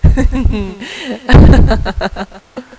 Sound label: laugh